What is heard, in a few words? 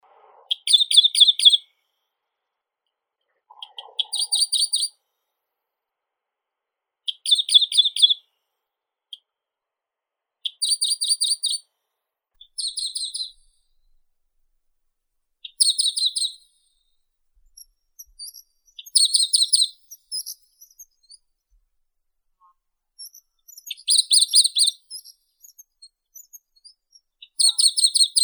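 warbler, Phylloscopus griseolus; penochka indiiskaya; zvuki prirodi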